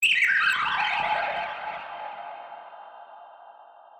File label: ghost